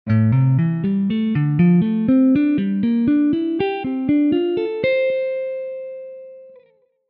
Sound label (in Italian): Esercizio, guitar